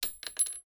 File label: cart